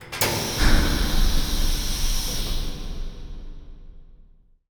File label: sfx sci fi; Masterbits - Switch FX